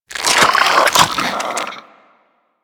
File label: Rifle Reload; Warframe; Infested; Quanta